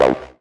303 Acid C; Vengeance Samples

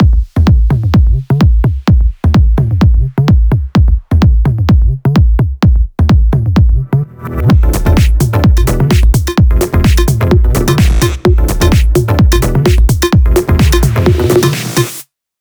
128BPM Air Raid Mix; VFH1 128BPM Air Raid Kit

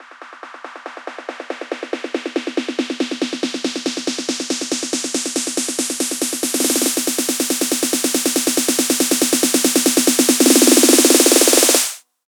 140BPM SNARE 8 Bars; Landscape; Vengeance Samples; Snares&FX Pairs

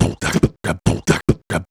Beatbox Loops, Human Beatbox; Vengeance Samples; Special Sounds

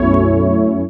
Synth Shots; Vengeance Samples

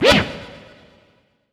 Short Fills & Reverse; Vengeance Samples

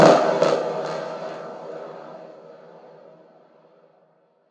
Snare FX; Ghosthack

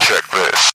Vocal Phrases; Vengeance Samples; Vocals

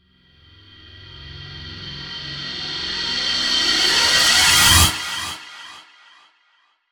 FX Uplifter

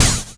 Minimal Perc; Minimal Percussion

